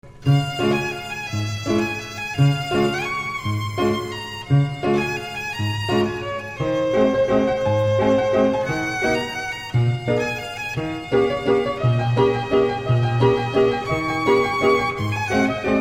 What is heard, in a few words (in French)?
violon, Musique Mécanique